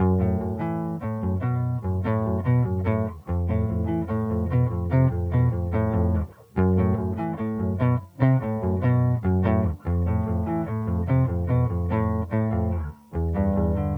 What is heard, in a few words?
viper bass